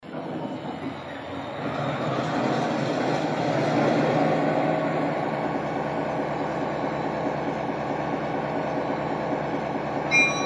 EMD 16-567

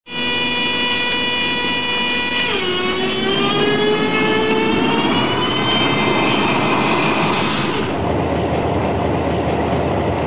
DASH9; US

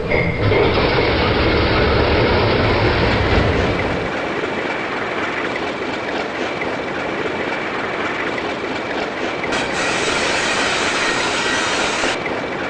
ALCO C425; US

